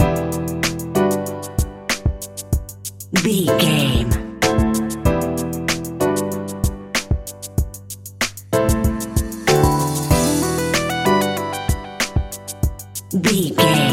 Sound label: upbeat hip hop; Upbeat Urban 60 Sec; Catch 22 Music Network